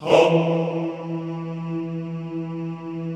HOMMM E2, Extended Classical Choir; Best Service

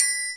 TRI, triangles; Univers Sons; Basicussions

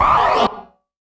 Yamaha CS1x Drums

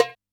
DJEMBE, Yamaha DD-65

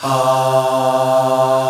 POP AHHS

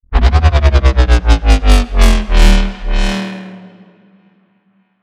BASS SHOT

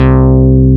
JUNO, Synth Bass, Analog Bass

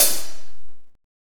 KOMMERZDRUMS

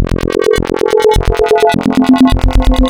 Spectral, wavetables; Architecture 32

